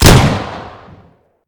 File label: pistol fire; svencoop; rngremake